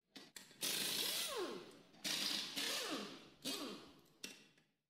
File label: impact drill